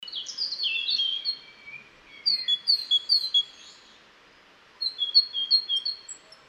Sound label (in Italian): Cinciallegra